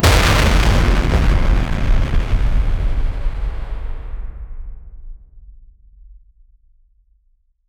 arcade explosion fiery

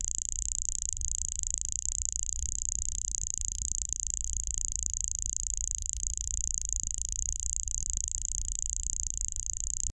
foley

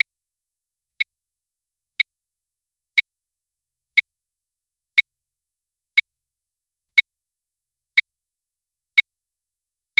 a short countdown tick, clock

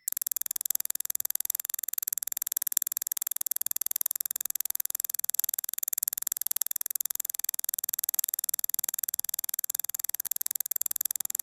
create a high quality sound, everyday objects

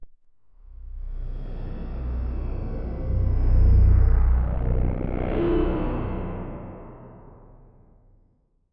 spellcasting sound

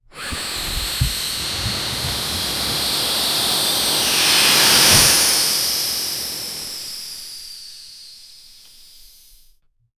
balloon inflating

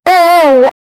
dark horror game sound, jumpscare

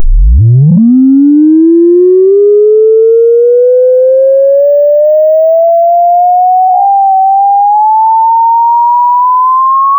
sine wave that lowers; arcing

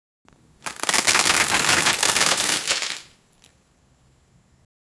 the sound of confetti sho

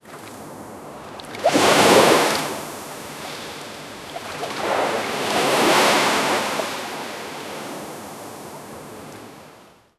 gentle ocean swells soft; sound effect

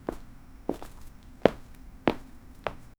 small sounds of little fo; indoors

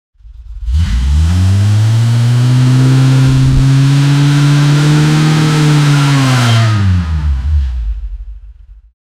mclaren supercar; 1 minute

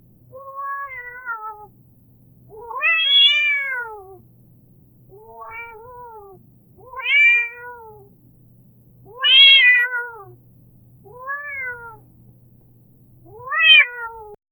cat need help, call

